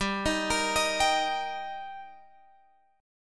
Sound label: G Minor Sharp 5th G Guitar Drop D, chord